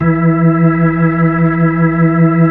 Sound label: B-3, Keyboards